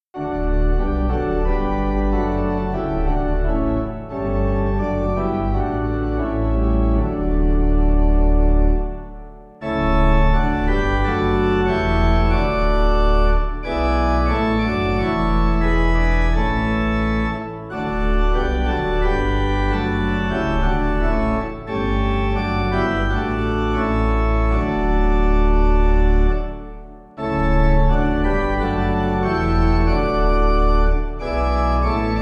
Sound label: Breathe On Me, Durham, Pipe